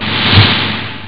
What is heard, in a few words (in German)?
trommler; ST-XX Amiga Soundtracker Sample Packs